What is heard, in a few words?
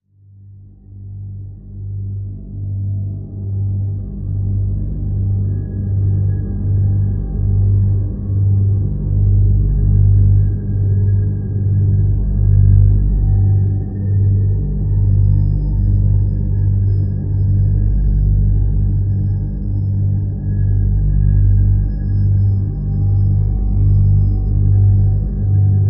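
Drone Deadly G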